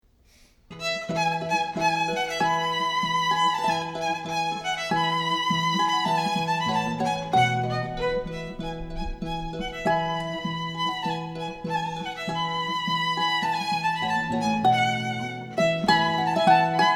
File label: Allegro from Spring w violin